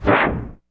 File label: cinematic impact wipe